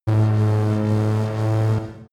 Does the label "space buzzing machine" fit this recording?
Yes